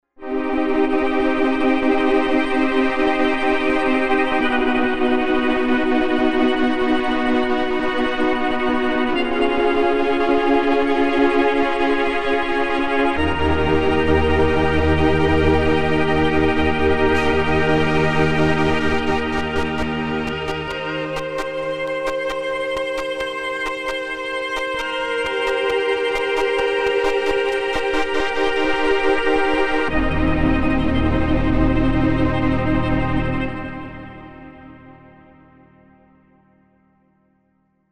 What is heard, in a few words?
Warped Cinema